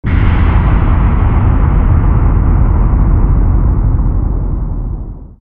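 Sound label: mysterious percussion, halloween; Garry's Mod; tf2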